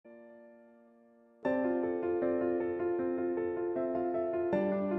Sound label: instrumental relaxing